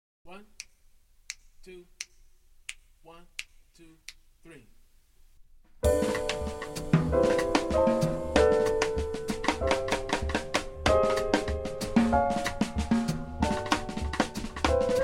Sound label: minus bass